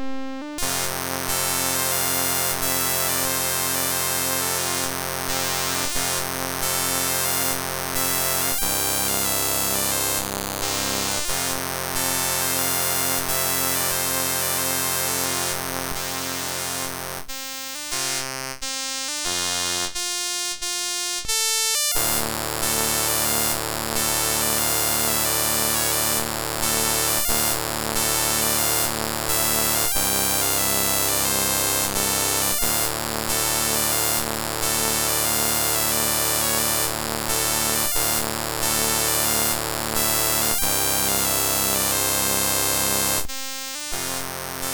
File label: Scotch Bagpiper, MOS6581R4